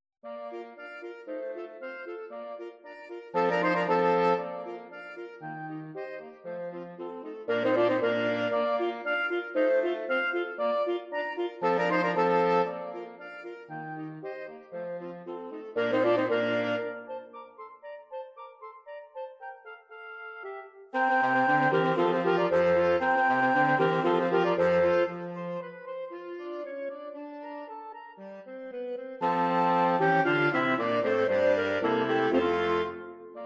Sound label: Quartet in B flat Allegro Grazioso; Mozart